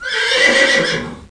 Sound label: HORSE; Tandy Sensation II